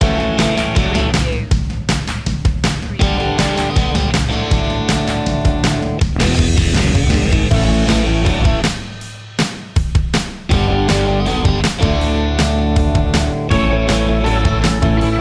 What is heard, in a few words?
moving forward